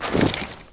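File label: body movement gear; Spearhead Demo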